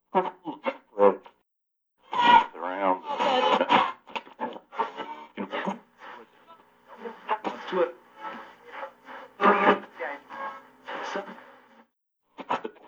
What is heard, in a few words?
Earth Tuning